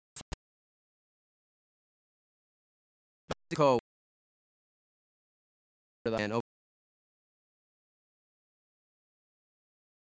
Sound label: Afro-Cuban Grooves For Bass And Drums